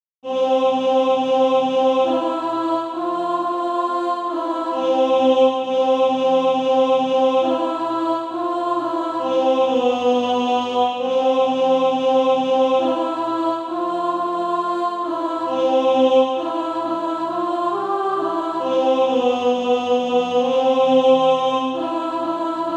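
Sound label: alto, Practise Music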